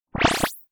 Flash laser

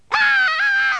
ahhhh; South Park

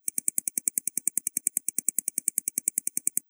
Flash clicks

